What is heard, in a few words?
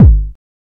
techno bass